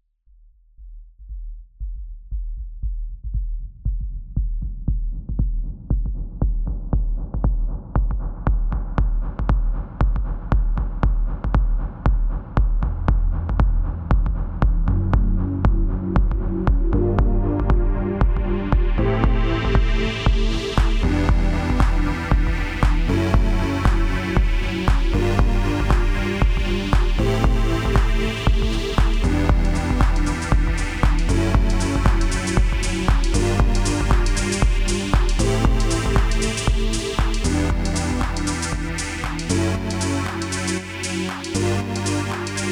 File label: Elektron Digitakt house